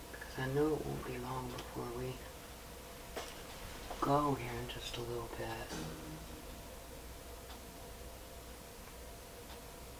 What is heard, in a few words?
Unexplained Guitar Strum; note there was a guitar in the room; Back Bedroom